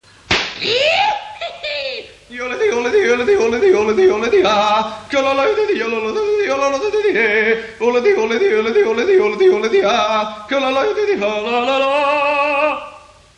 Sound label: yodeler